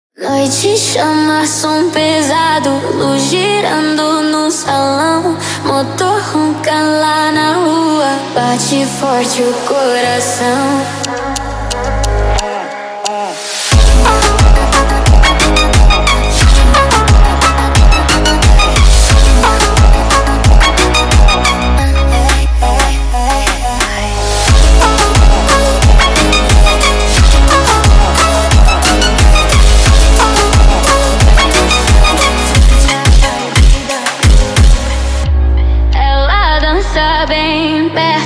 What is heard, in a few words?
sped Up